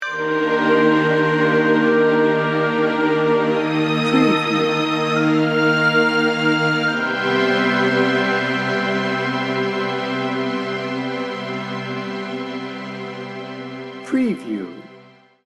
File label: peaceful dreams sting bumper